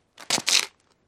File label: foot scuff concrete